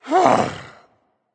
content berserk; cuchulainn

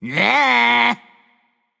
nv tongue; smite; voicepack; hachiman

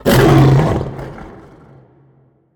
roar; smite; chaos titan

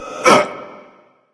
grunt hit; smite; tyr

